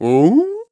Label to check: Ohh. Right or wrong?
Right